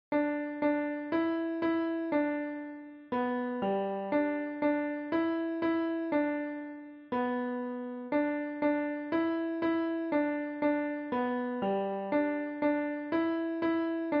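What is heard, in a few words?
piano; Backe backe Kuchen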